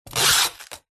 office sticky tape dispenser gun apply tape to cardboard box